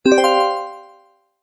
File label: points Max; robot collector